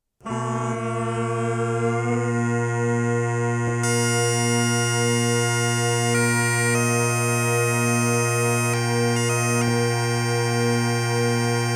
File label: pipes